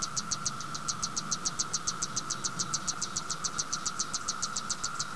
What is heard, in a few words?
Periodical Cicadas; Michigan Cicadas